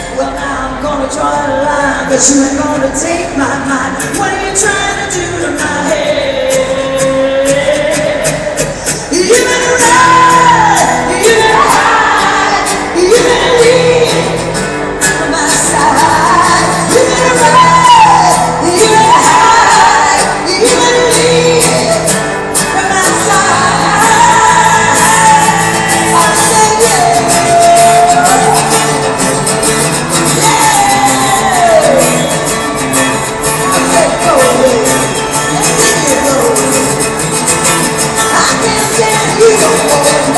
acoustic

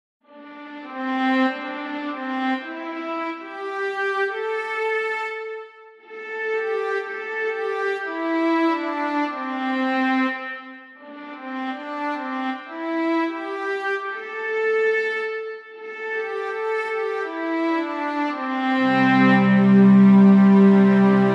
lark ascending

